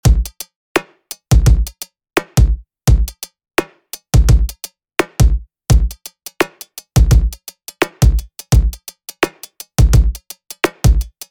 85bpm Drum and Synth

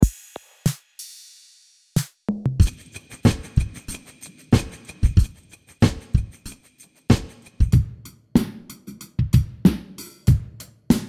Drums

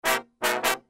brass loop

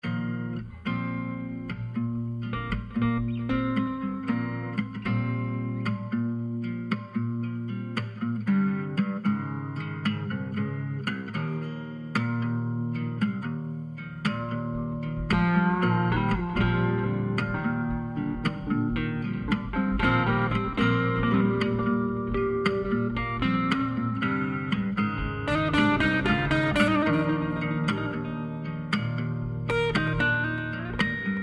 Groove

